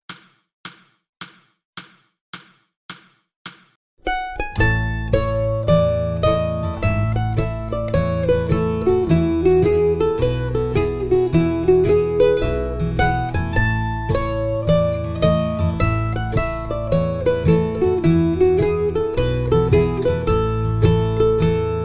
Slow Mandolin

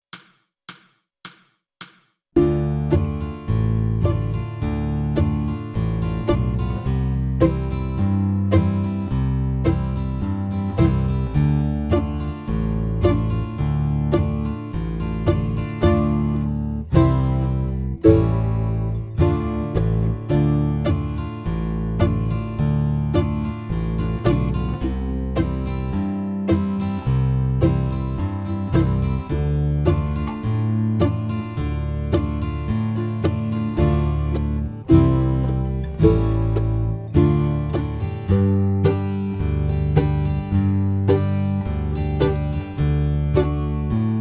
No Melody Slow

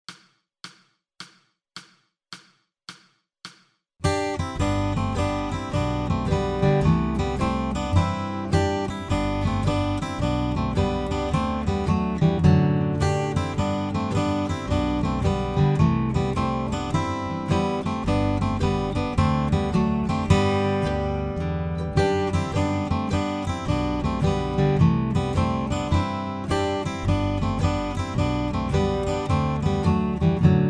Guitar Duet Slow

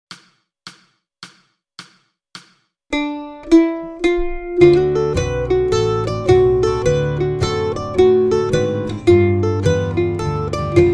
Interstate Rag Mandolin Slow

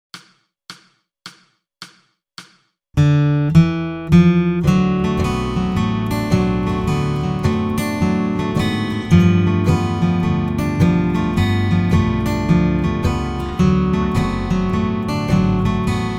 Interstate Rag Guitar Slow

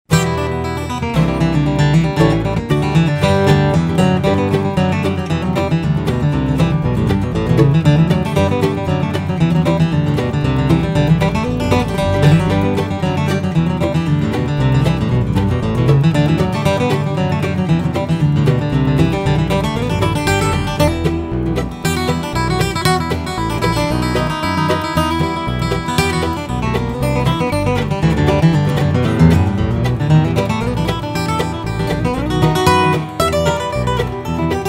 intro jam guitar

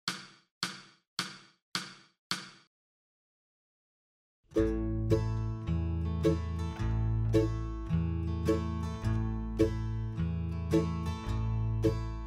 Gold Rush Rhythm Slow

